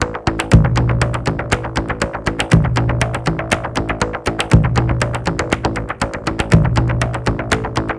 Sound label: Perc; GrooveMaker demo; Serious Software; Macworld 1999-04